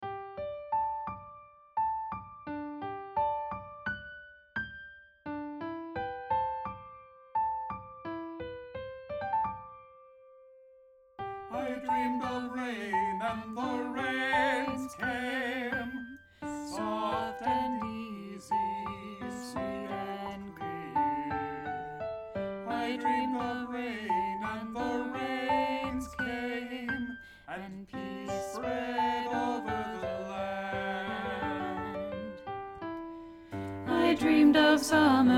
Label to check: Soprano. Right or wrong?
Right